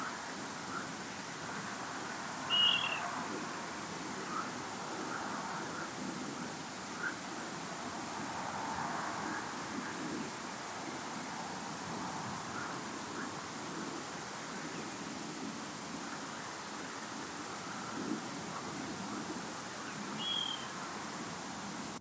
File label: Water Rail